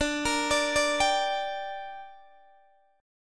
D Suspended 4th Sharp 5th D down Guitar Open D, chord